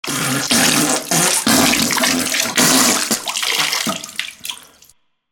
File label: POOP; eShop